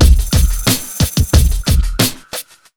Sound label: beat, Underground Hip Hop